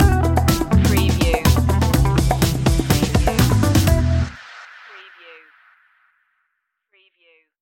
funk stinger

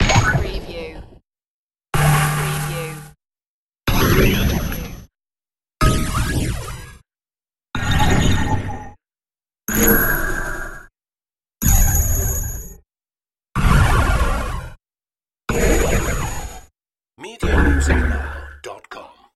starship sliding door

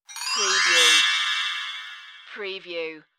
Bells Chinese Bell Tree

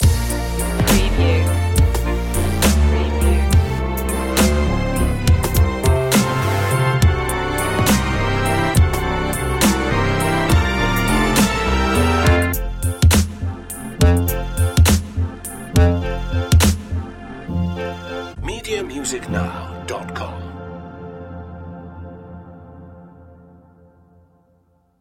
the garland waltz dubstep remix

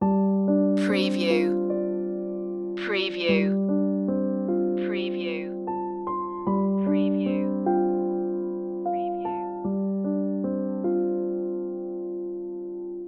ripples loop

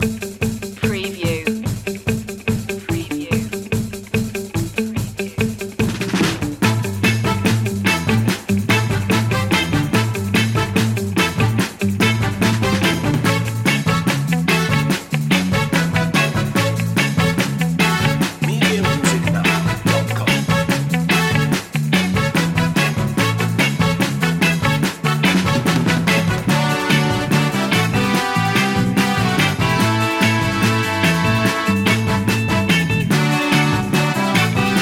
give me one time no organ

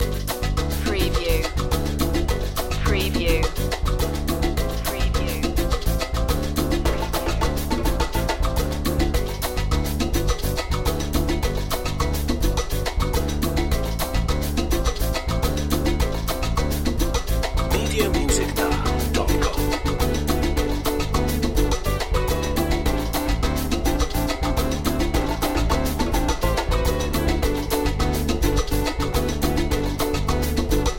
samba festival version groove

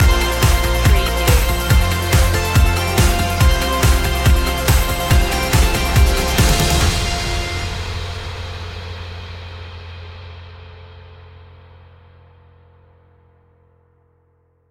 bullet trains stinger

